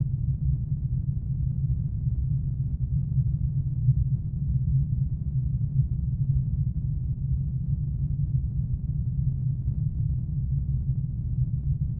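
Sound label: ambience perlin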